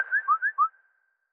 Number

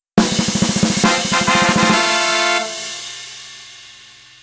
Jingle Fanfare; Convenience Stories

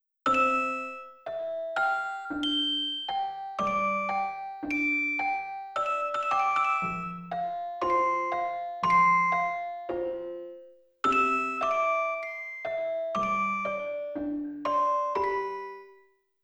MUSIC BOX

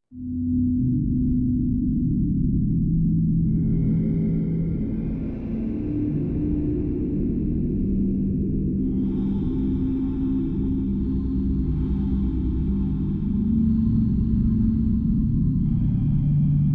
Amb Outdoor

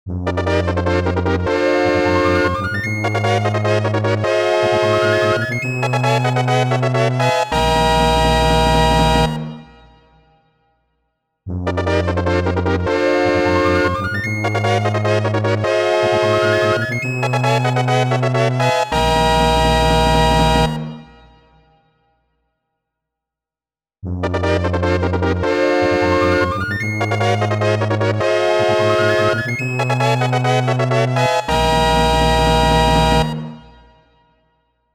Boss Clear Fanfare; The Legend of Zelda: A Link to the Past

